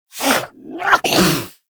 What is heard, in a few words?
Pet Sneeze; Enemies; Warframe; Catbrow; PetShip